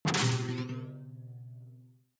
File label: sci-fi alien splash detuned; swooshes-swishes